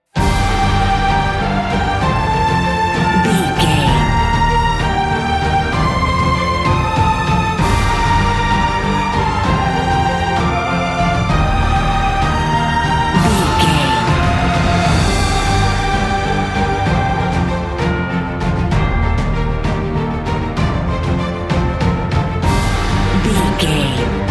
epic cinematic

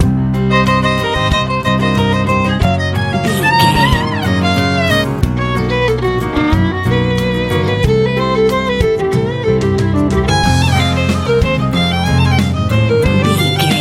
hot colorful country rock

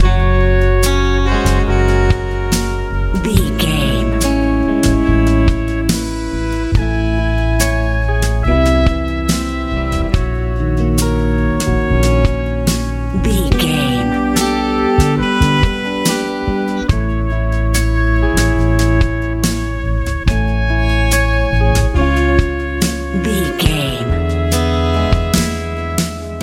lite pop

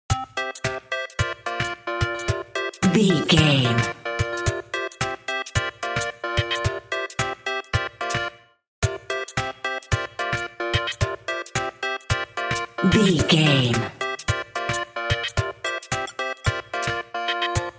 happy video game level bed

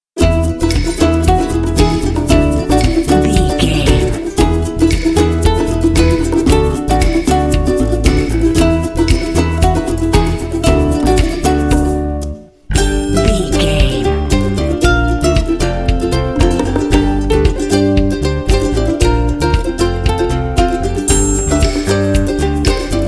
happy ukulele

